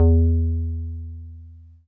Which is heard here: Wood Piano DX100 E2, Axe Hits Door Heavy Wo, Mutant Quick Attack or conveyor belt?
Wood Piano DX100 E2